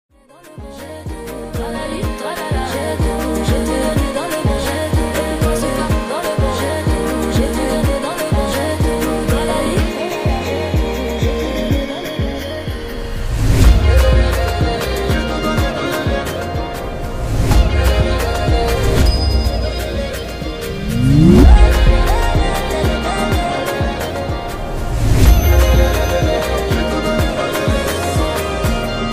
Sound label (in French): guitar version, guitar; با صدای زن و مرد